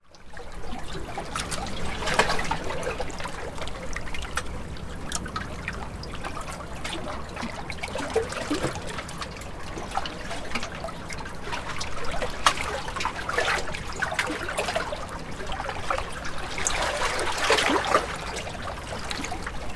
nature ocean gentle waves amongst rocks distant surf